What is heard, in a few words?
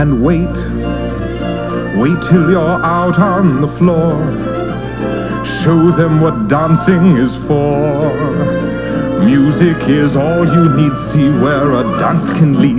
singing